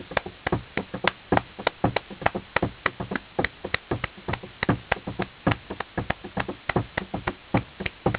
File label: percussion